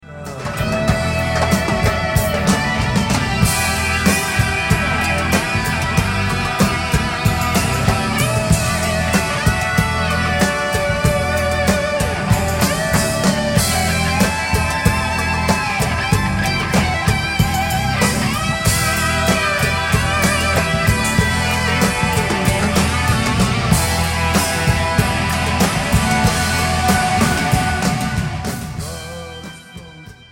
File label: Solo, Soundboard